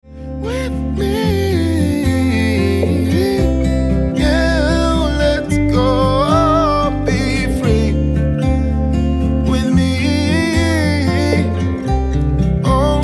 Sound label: Acoustic Version Ringtone